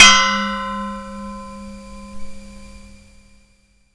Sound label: ding; Metareal; MetarealAudioEditor